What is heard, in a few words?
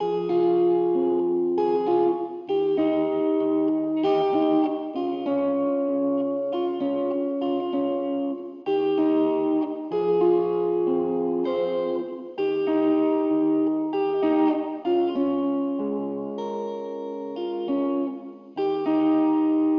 Nostalgic Melody Loop - 97 BPM G# Maj Chords; Melodies; Loop Stems; Cymatics; Oracle Sample Pack